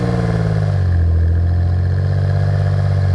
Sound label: Car decel; urbanchaos